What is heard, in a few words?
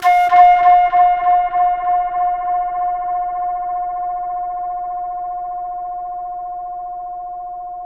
pan flute